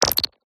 Glitch; PMSFX

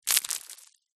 stones small debris fall down pile; foley

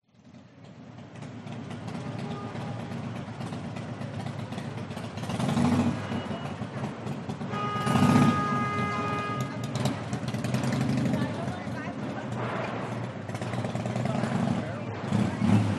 New York City Traffic Rush Hour & Cars Honking

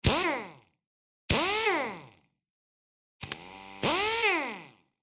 Pneumatic Tool Drill Not Muffled Short Bursts; noise creations

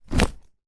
bag holdall leather place down; foley; zapsplat

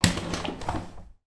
household window double glazed open; sound effects one

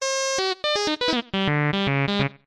synth bebot sawtooth robot vocals; jessey drake

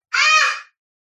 human child boy 15 months cheer shout other room; zapsplat

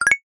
multimedia ui notification alert short glassy

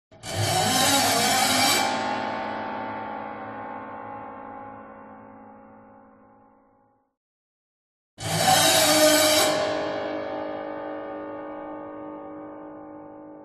CINEMATIC HORROR Piano Bow String Broken; smartsound

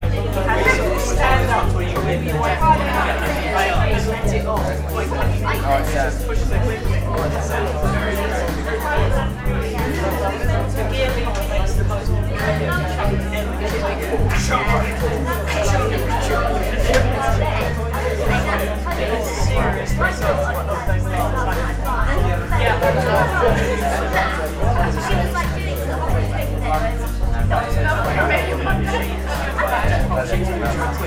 ambience bar small busy r&b music; sound effects one

